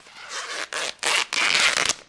Tear Corn Husk; SFX Kit; Foley - Creaks

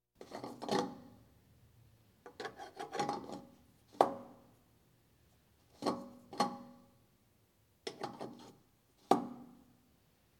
Police, Fire engines

